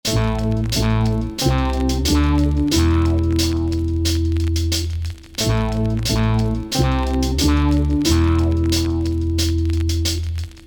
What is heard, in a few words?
Intro Groove